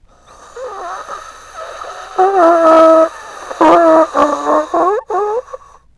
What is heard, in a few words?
animatron breath; slender; fivenights common